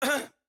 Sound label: AHurt; Morty